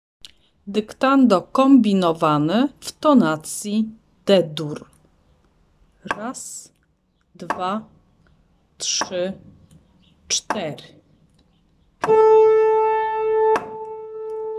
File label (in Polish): dyktando D dur